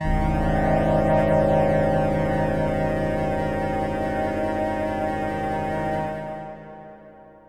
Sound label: SYNTH PAD AMBNT 160 C, Ambient; eJay; Sphere